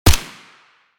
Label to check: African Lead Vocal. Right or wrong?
Wrong